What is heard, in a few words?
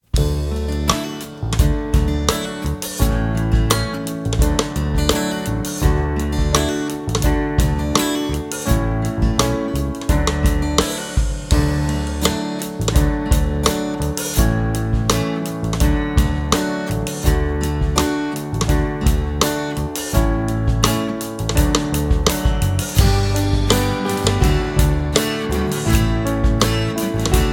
(karaoke)